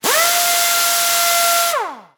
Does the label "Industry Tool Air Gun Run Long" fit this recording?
Yes